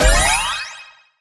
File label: Power Up; subway surfers city sound effects; Android, iOS